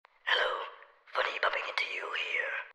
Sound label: phone call